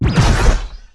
sniper underwater, weapons; Tribes2

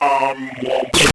deathcry; Tribes2

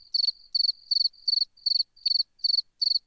crickets; Tribes2

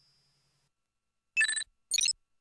inventory hum; Tribes2

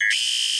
vote no pass; Tribes2

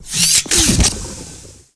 plasma fizzle, weapons; Tribes2

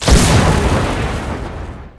deployables explosion; t2-mapper; explosions

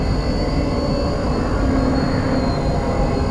shrike engine, vehicles; Tribes2

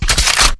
generic switch; weapons

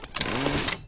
mine deploy; Tribes2; t2-mapper

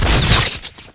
shield hit; Tribes2

